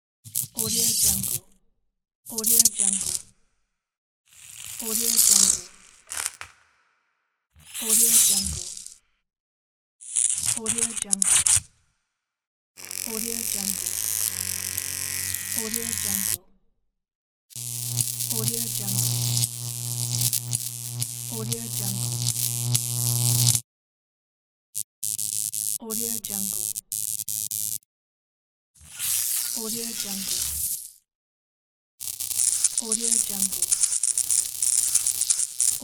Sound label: electricity